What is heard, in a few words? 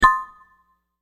marimba high; simple ajax chat